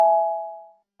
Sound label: decision confirm